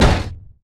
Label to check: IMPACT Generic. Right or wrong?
Right